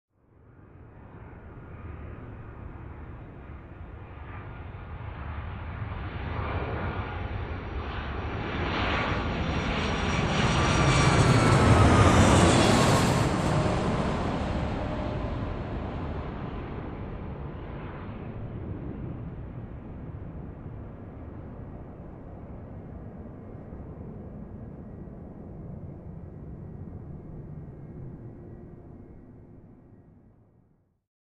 Commercial Aircraft Flying Over

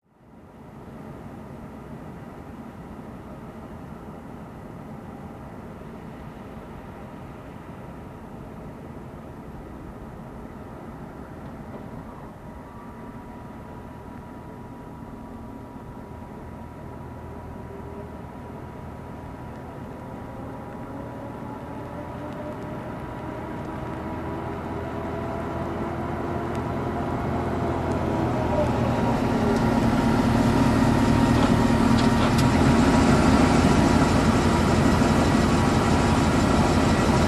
Cars

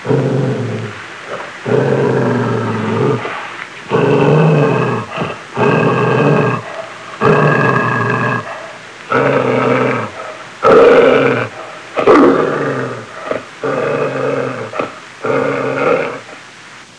Growling dog vintage sound

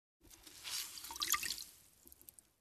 Pouring Water

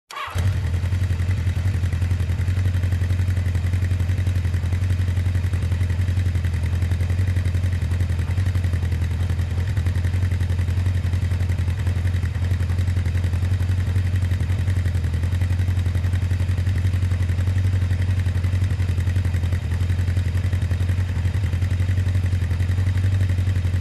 Motorcycle engine idle running

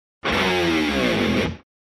Distorted rock guitar tremolo lever down

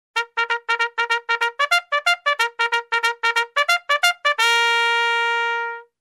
Trumpet race start melody fanfare

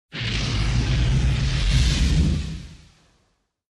Flamethrower

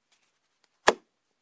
Slot Machine Clink

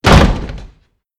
Door Close Heavy slam

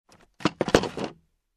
Placing wooden chair

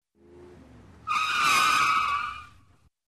Car Emergency Break

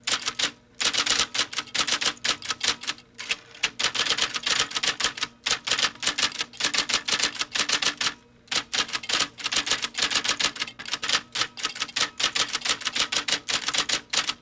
Typewriter typing decent speed

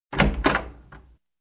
Wooden Door close

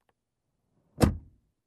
Car Door Close

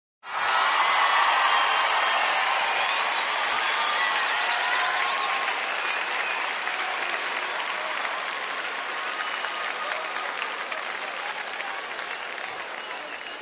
Crowd in a concert cheering and clapping

